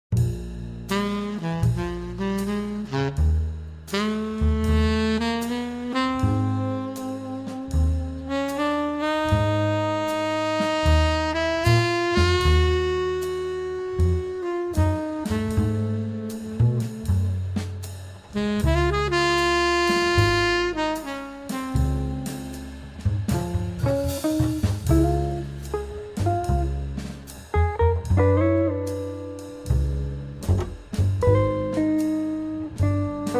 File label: Double Guitar Quintet